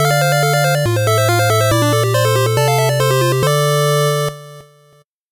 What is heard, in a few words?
8bit jingle slow